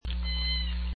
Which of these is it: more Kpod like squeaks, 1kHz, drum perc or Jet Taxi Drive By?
more Kpod like squeaks